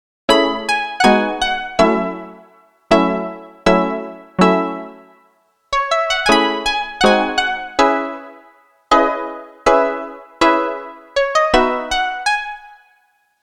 Pluck

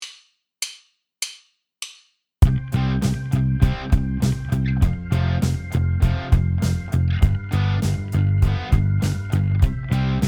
bass

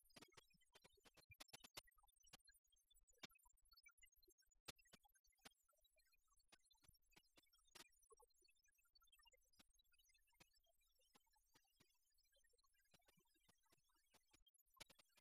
iRig sound check; guitar

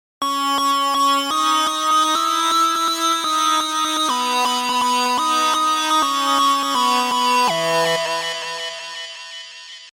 Synth Lead

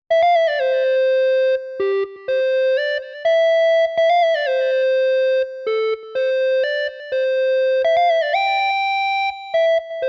synth lead wet

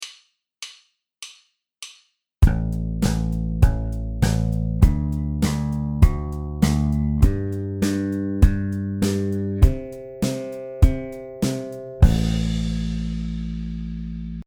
thumb hurinuki

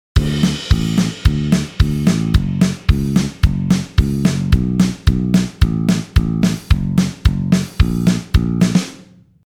Bass

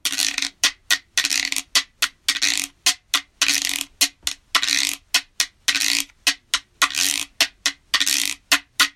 Guiro

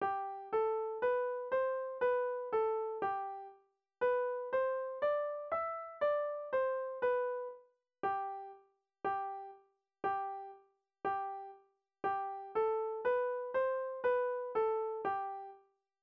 G major, piano

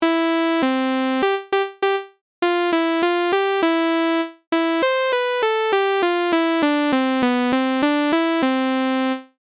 Saw wave

